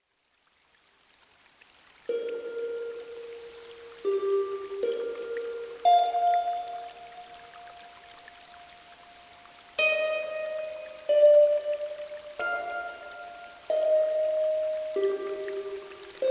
Seashore Waves, Flowing Waters

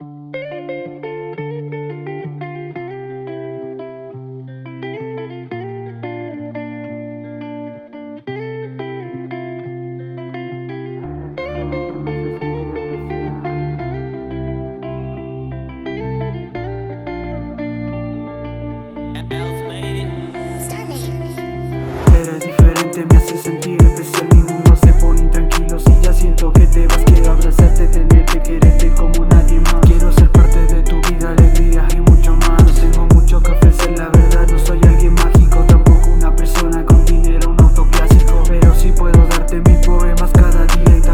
Rap

